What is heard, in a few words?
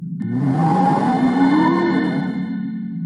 Ghost Collect